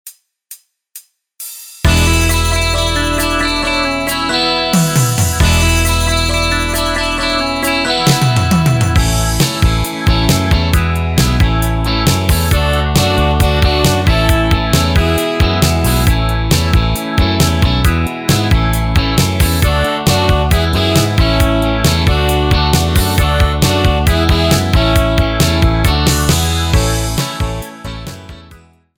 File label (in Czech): bez melodie